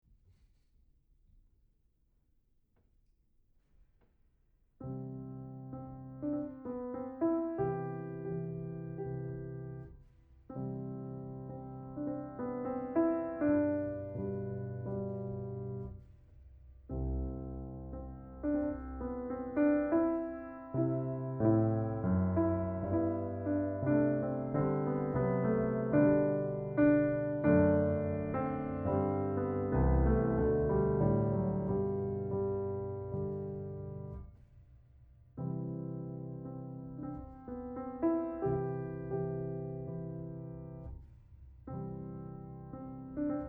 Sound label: Fmaj